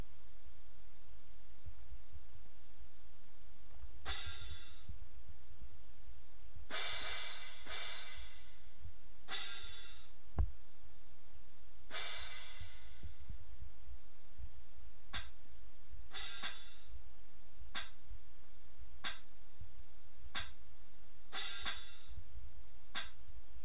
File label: cymbals